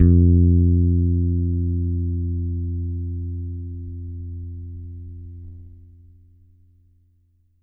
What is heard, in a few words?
T-BIRD BASS FINGER F2; Bass Mace; DirectWave